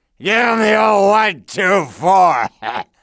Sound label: Bum CHEER; TrueCrimeStreetsOfLA; XBOX